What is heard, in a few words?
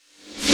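snare reverse, DnB Breakz Kit; Breaks N Beats Sound Kit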